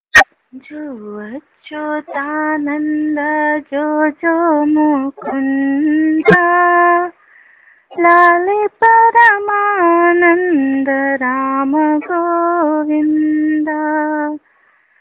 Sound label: telugu song